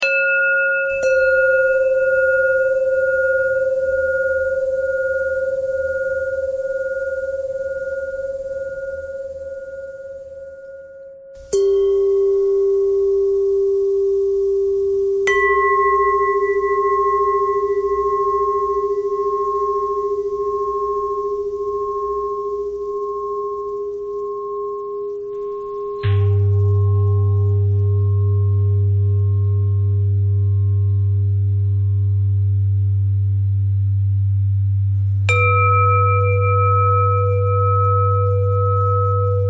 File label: bell threads